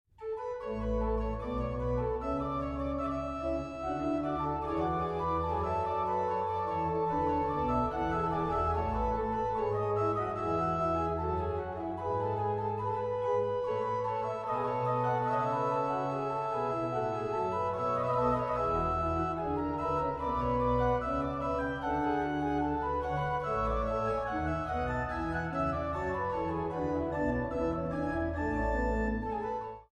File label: trio super allein gott der hoh sei ehr; bwv 664; js bach